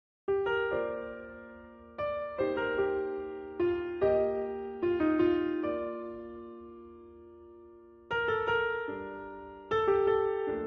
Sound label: piano